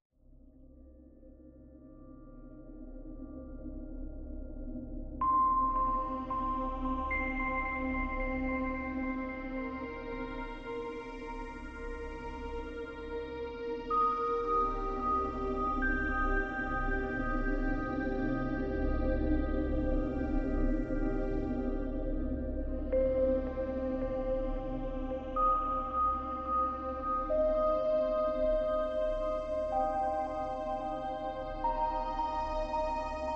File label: suspense; The Mystery Team; PSP